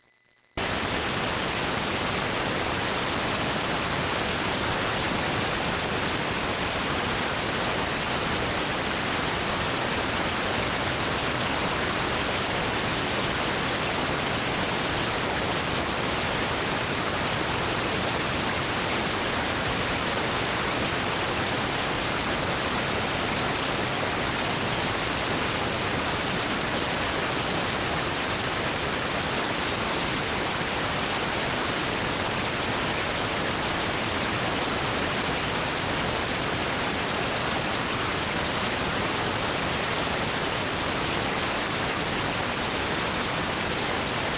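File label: radioteletype receive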